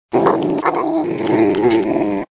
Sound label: Coyote Growls